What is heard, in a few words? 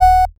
Sound FX Bleep